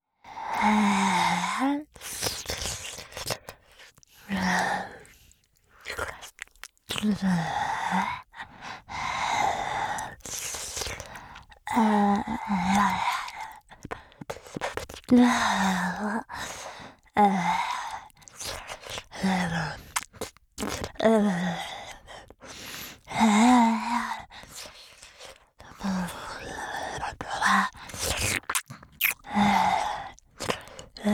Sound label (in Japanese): チュパ音, ASMR; チュパ音抜粋集; 包皮と亀頭の隙間に舌をねじ込んで舐める